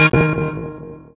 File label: hitsound beepo; cstrike; tf2